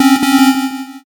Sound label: wrong